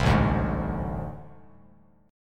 A Minor Major 7th Double Flat 5th, down, Bass Standard; strummed; JGuitar